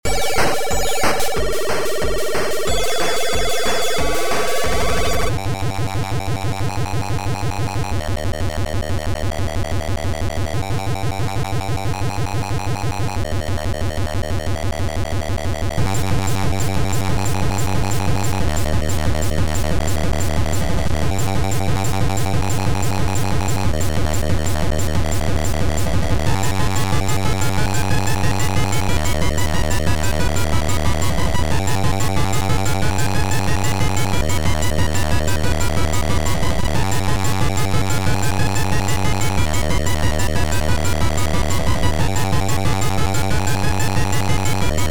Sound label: Techno, music